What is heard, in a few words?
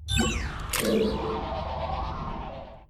sonic dispenser; p00gie